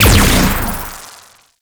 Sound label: Shot, Shotgun; Crp BE Arca Plasmor